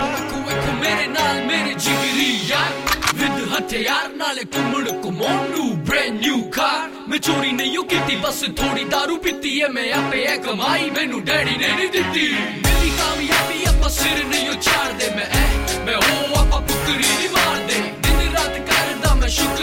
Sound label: Rapping